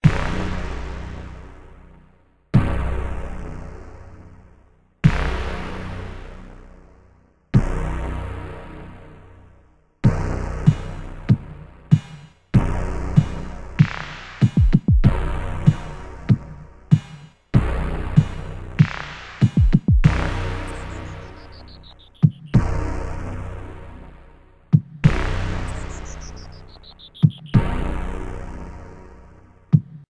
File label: Dialogue; Valkyrius Prime